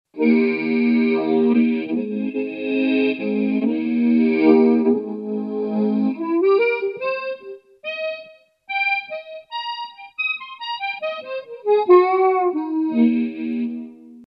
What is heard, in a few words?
Chorus Phase Flange Detune; DigitechRP; RP200